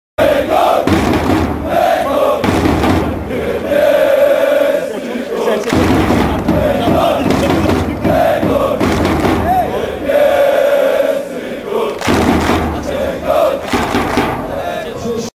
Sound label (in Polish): hej gol hej gol niebiescy gol; spiewnik